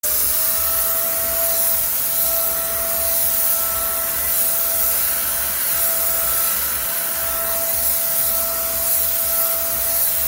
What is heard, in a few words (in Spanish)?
Sonido Pistola Para Pintar